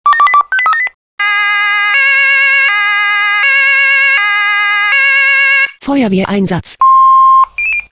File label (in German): SMS Alarmierungstone Feuerwehr Einsatz